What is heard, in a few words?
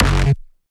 Bass